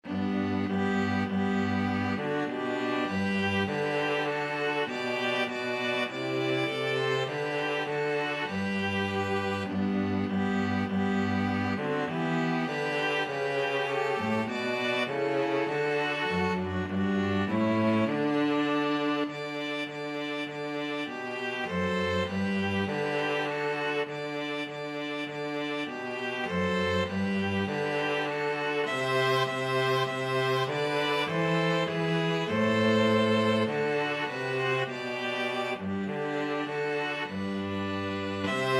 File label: string trio